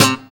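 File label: GUI PULL, acoustic guitar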